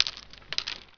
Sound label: Zipline Grab; Playable Tutorial Level; TR2